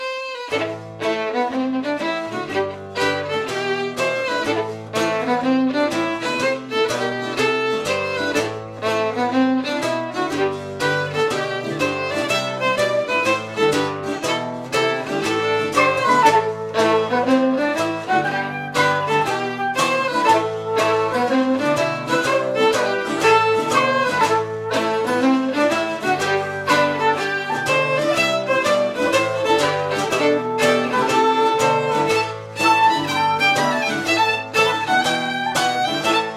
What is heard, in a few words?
Orchestra Ambience; Assassin's Creed III